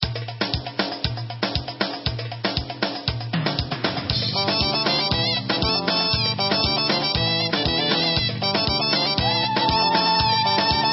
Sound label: Instrumental; Ashuri